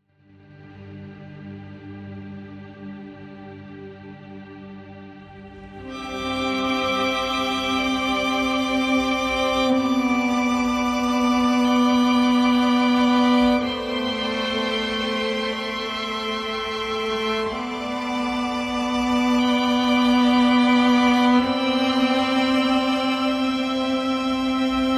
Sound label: Strings and Pads